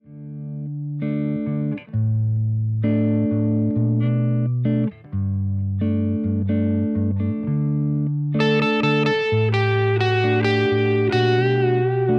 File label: pop, pickups